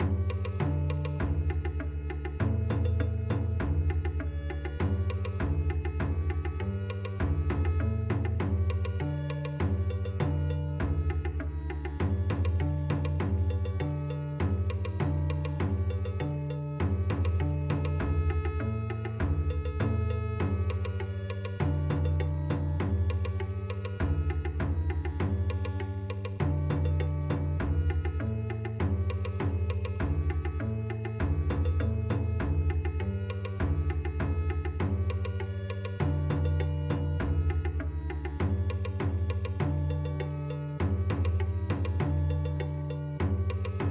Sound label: festival dance Bon Odori